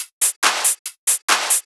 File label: Loops 140 BPM Hihat Percussion Loops